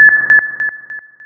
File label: blimp horn